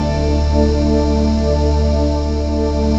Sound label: CMI ORG BREA; E-MU Formula 4000 Series Vol. 2